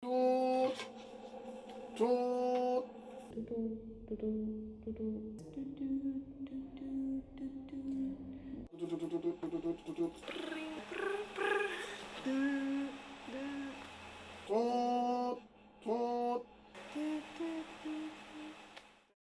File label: imitating phone sounds